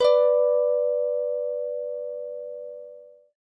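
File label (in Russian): тональность гитары струна D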